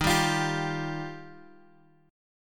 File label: D sharp Major Add 9th, Guitar Open G